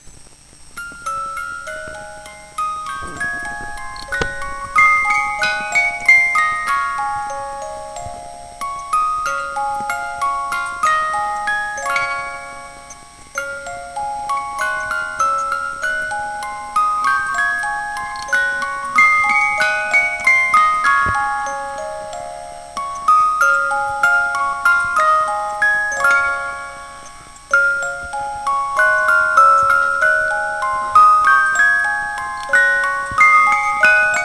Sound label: christmas music swing